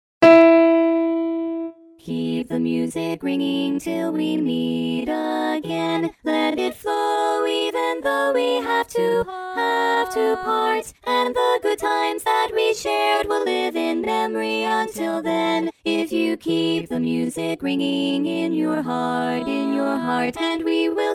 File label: Bari Dom Fast